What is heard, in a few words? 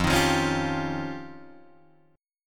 F 7th Suspended 2nd Sharp 5th, Guitar, Drop D